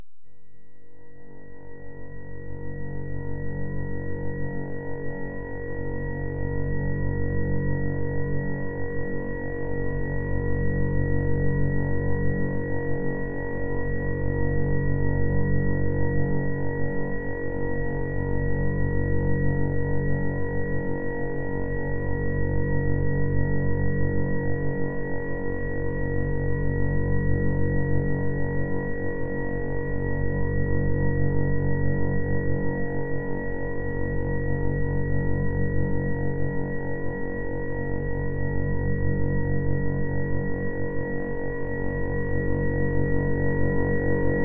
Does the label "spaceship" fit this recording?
Yes